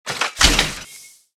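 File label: weapon power fist fire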